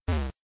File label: bump; pokemon reborn e19 2022